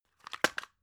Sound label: drug package drop hard; berufe; arzt